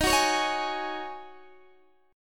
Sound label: D sharp Minor 11th D sharp Guitar Open D, chord